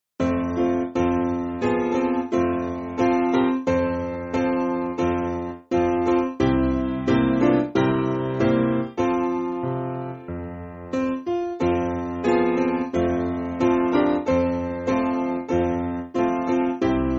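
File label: Piano, Music Backing Files for Churches; Have You Failed; Lubbock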